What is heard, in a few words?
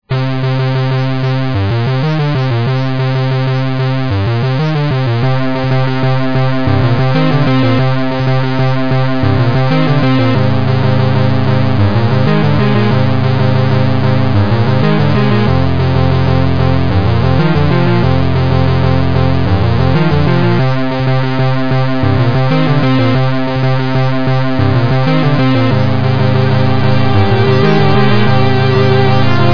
song; sven